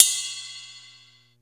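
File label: RY30 bell ride; Hollywood Edge; The Big Bad Beatmachine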